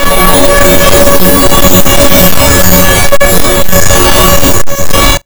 Retro Electric

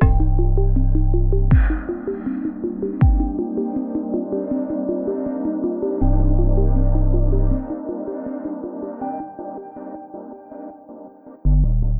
Breakdown; BB 2HTC Samples Vol 4

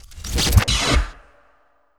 Transition; FREE SFX PACK VOL 3

